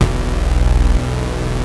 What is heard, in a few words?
Prophet 5 B1; Hollywood Edge - Giorgio Moroder Rare Synthesizer Collection